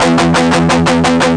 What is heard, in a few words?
fast riff, guitar; OctaMED SoundStudio V1